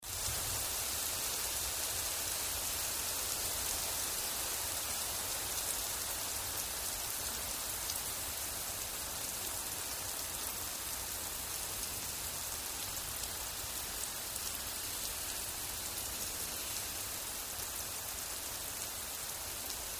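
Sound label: Heavy Wet Rain Shower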